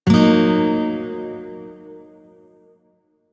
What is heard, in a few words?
Shawzin Yo Chord C; Music; Warframe